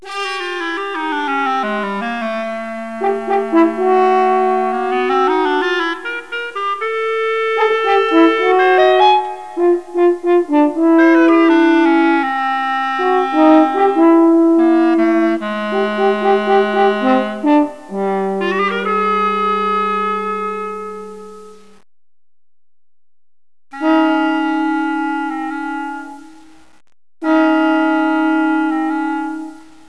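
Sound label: clarinet; 8bit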